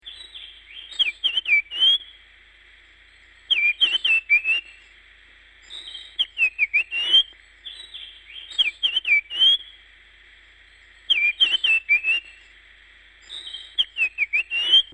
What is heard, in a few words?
bird